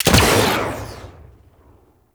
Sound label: Freeze Ray Shot; Warframe; Venus; Venus Shockwave Spaceman Agent